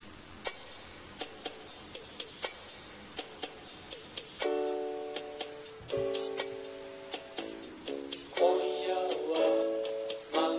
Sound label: taiko